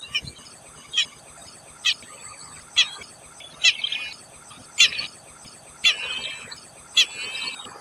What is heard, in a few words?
White-browed Crake calls, Tambun Interior Perak Malaysia